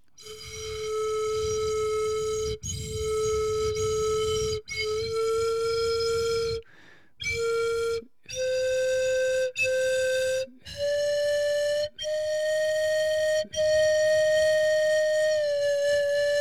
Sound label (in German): floeten sample